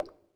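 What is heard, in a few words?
texting cursor left; STEAM; tenfoot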